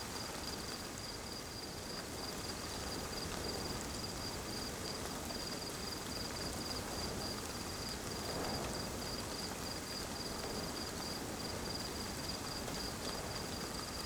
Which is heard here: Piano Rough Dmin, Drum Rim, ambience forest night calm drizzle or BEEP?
ambience forest night calm drizzle